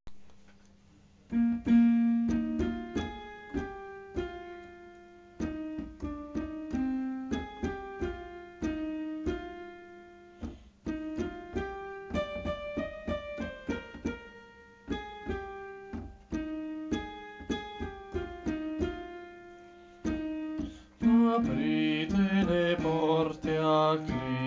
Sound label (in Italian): bassi; Coro Isola del Giglio